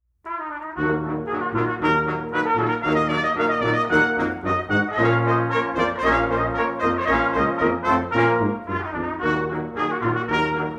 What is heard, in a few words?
Turkish Rondo